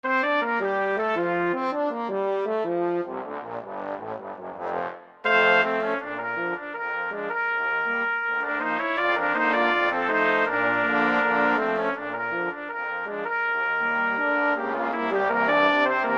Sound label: Brass Quintet